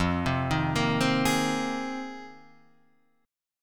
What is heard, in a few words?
C Minor 7th Sharp 5th, F, chord; arpeggiated; JGuitar